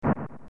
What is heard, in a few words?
SFX Sand; meco kong windows gamerip 2013